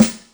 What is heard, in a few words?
Loosy P, Yamaha DTXpress Acoustic Snare